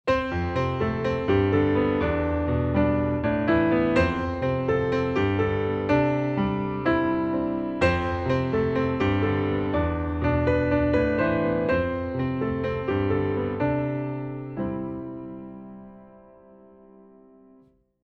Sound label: PIANO